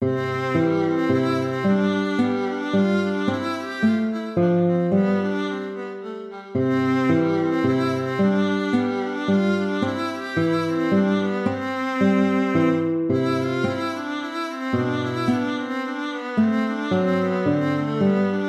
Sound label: tempo minuetto; hook james